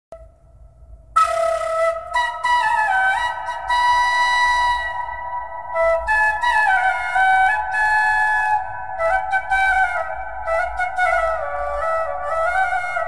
flute song bgm cover; Kushi; pawan kalyan

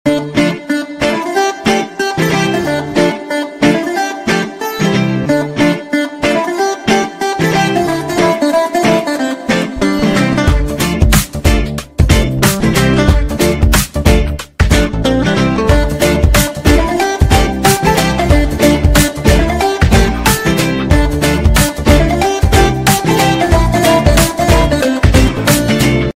movie bgm; bangaru bullodu; allari naresh

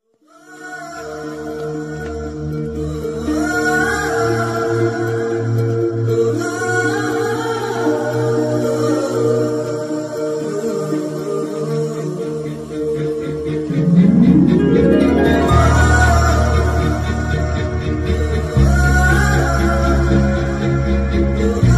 telugu romantic song ringtone; Nani

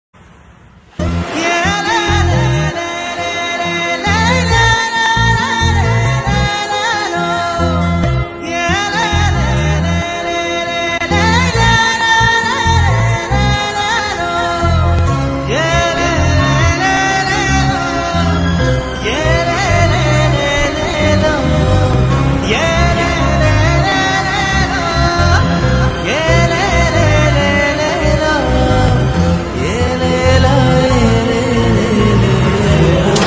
sad bgm tamil

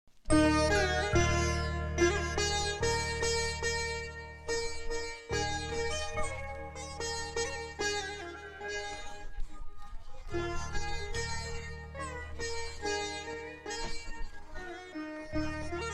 malayalam bgm; nandanam; prithviraj; navya nair

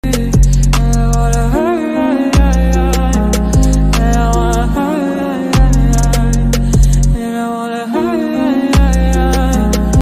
sad turkish ringtone turkish ringtone

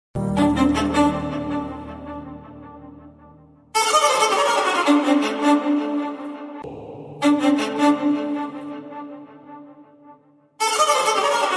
instrumental bgm theme; bimbisara; nandamuri kalyan ram

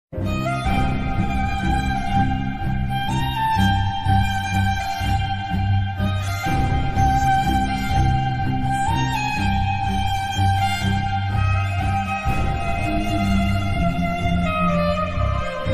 sad bgm ringtone; raja rani

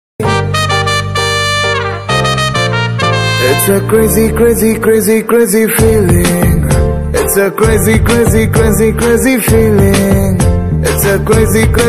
love bgm ringtone tamil bgm; nenu shileja

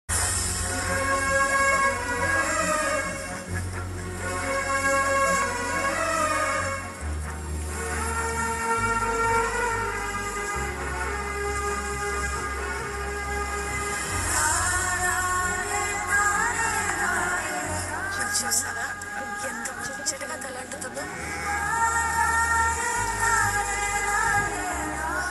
love bgm; bangarraju

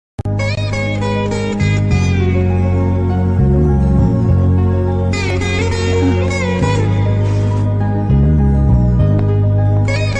background music love feeling; kushi movie

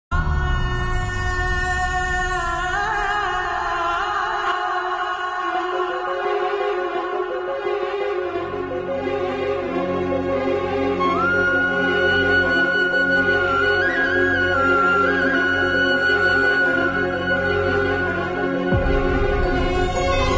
touching bgm ringtone background music

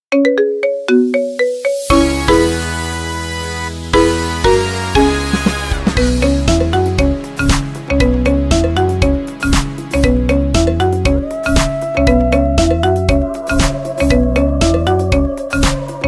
marimba halloween ringtone

